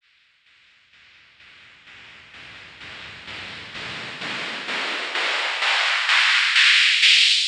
Cym FX R

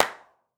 Acoustic Clap

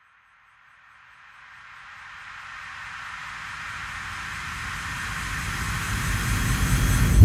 Impact R; Reverse; Processed

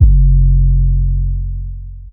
808 F

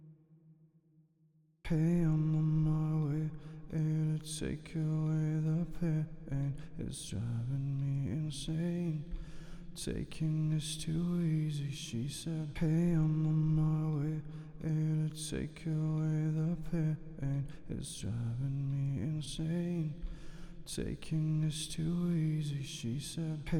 main vocals

